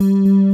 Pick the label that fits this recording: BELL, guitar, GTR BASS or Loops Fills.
GTR BASS